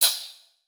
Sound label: Multimedia Internet CD-Rom Flash Short